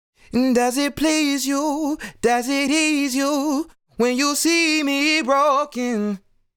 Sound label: Sung Phrase Dry, 70 BPM G# Min; Broken; Cymatics; Euphoria Vocal Sample Pack